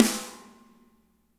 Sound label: MED SNR; SNARES; Spectrasonics - Burning Grooves CD2